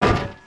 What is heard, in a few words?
Spider Cage Yank; creatures organic